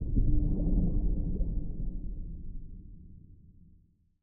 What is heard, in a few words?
spin Start